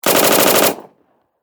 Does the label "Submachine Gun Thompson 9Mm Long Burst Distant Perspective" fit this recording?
Yes